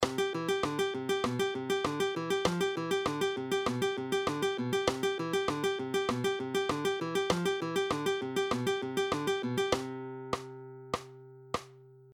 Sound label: Alternating bass Fingerpicking, Guitar Plucking